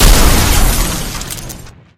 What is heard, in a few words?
enemy Spider Destroyed Explosion; gamerip; Mars Sortie